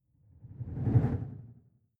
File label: whoosh simple flutter phase low